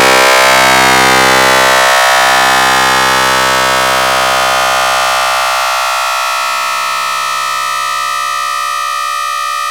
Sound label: VCO ENV FM; Zero-G Ambient 1